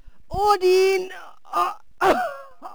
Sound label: die; khanat; megaglest; valkyrie